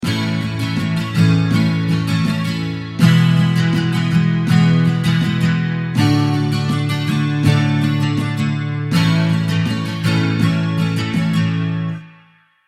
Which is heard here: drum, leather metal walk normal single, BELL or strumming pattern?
strumming pattern